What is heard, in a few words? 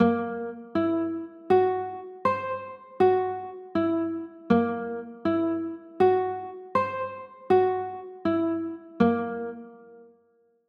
c 7b5, chords